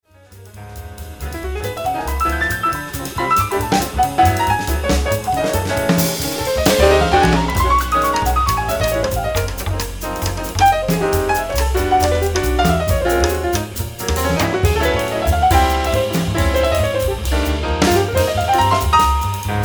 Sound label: piano